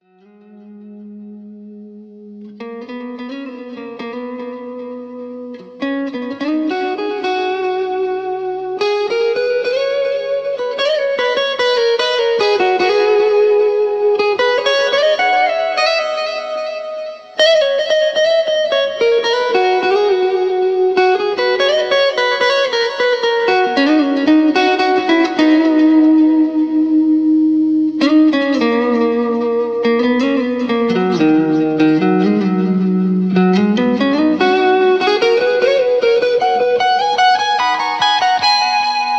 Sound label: instrumental